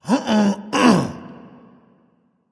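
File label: nonverbal clear throat; smite; voicepack; ullr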